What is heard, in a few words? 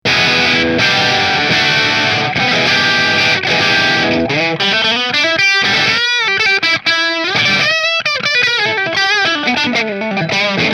Burny Bridge Marshall